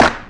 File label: CP, CLAPS, House Side Of 2 Step; USB Soundscan vol.51; AKAI